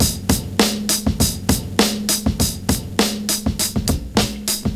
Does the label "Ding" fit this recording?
No